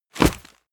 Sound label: medieval menu medium close, swooshes swishes